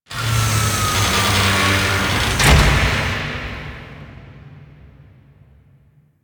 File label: Grineer Ship Extraction Ship Close; Liset; Tenno